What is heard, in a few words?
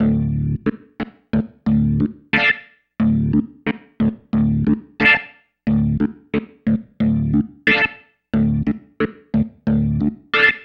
Pattern, Organ